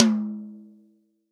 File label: TOM, Real Drum Kit, POP KIT